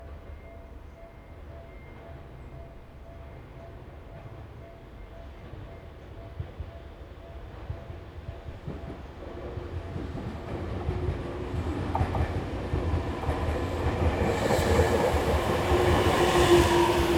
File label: train; a10